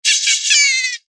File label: evil laughter real